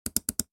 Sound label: Mouse Click